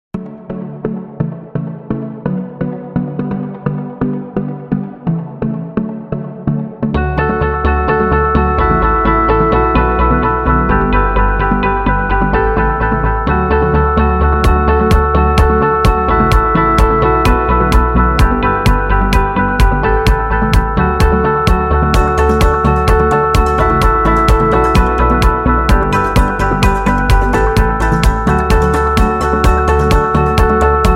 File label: Product Ad